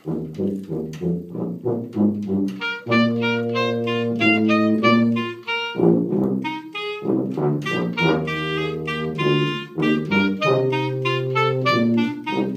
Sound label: tuba and clarinet; Fourier transform demo